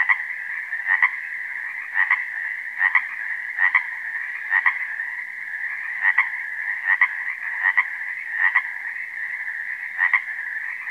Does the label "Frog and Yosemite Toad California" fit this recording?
Yes